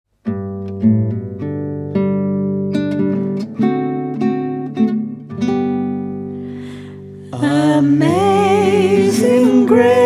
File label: Amazing Grace country